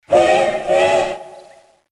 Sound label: C50 short double